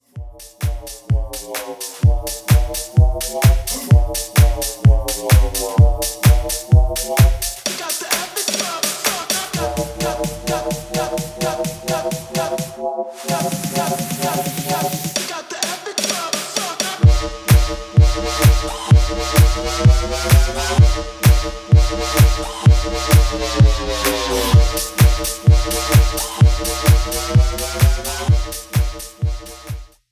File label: EDM